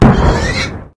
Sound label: skill3 land